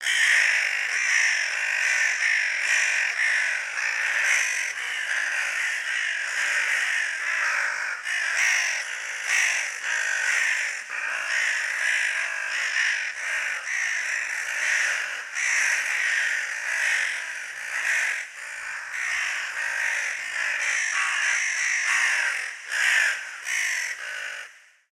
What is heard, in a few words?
crows